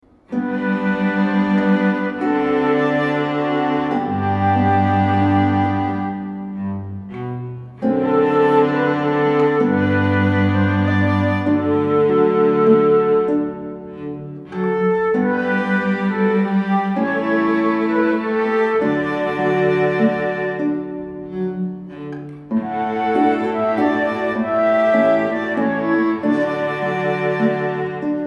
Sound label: guitar